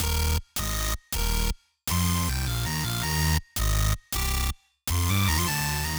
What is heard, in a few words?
Bassline Processed, hyperpop beat